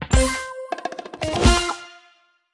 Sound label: End Run Screen Crew Bonus; subway surfers city sound effects